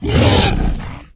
flesh aggressive; STALKER; customnpcs

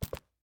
cloth, step; STALKER; minecraft

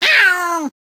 cat given damage; STALKER

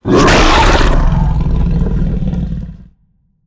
chimera agroed growl; mutants; STALKER; customnpcs

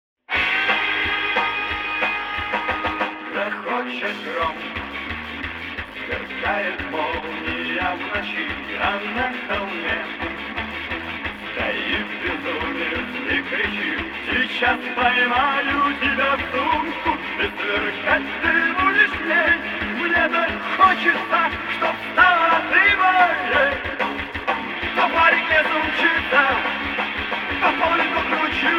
radio music; STALKER

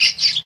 mrat hit; STALKER; customnpcs

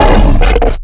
giant take hit, mutants; STALKER; customnpcs

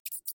mrat make hit; STALKER; customnpcs

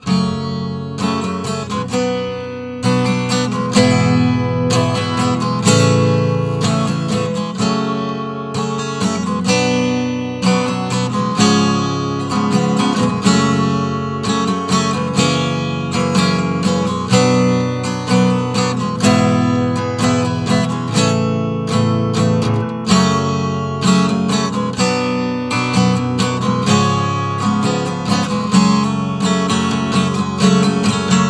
guitar; STALKER

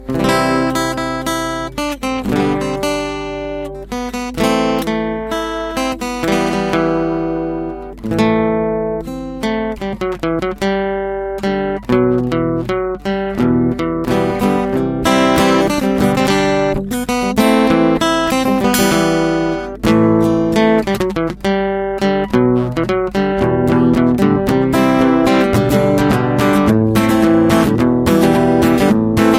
guitar; STALKER

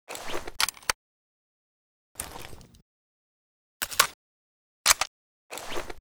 ppsh reload; STALKER

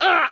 freedom hit; STALKER; customnpcs

